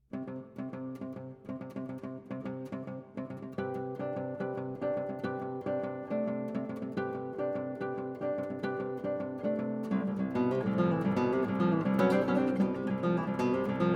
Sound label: Guitar Solo